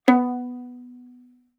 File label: Violin pizz ff sul G B3; MIS Pitches - 2014; Strings